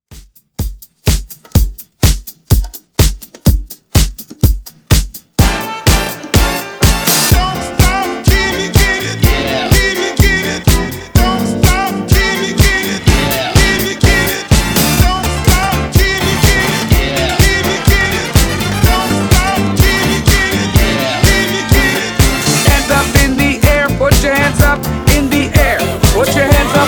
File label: Hype Intro Redrum 125bpm